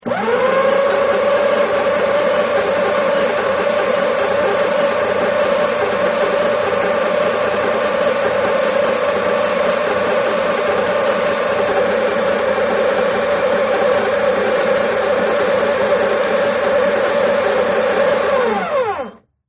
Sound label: Paper Shredder, Paper Shredder Turned On Turn Off Without Shredding Any Paper; Servo, Motor, Beep; Miscellaneous Office Sound Effects